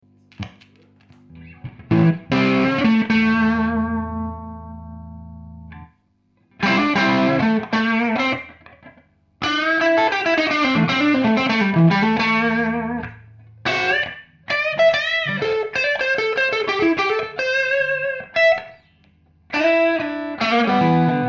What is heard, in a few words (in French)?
JMP entrée I Squier 51 position manche Texas Special